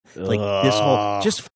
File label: groaning